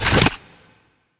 space tile pickup

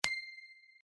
coin

